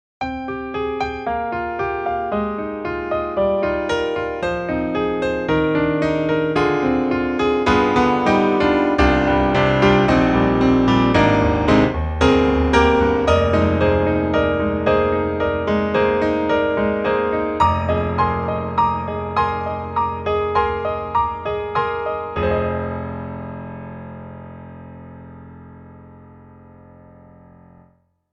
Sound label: grand piano mono pop; IK Multimedia; SampleTank3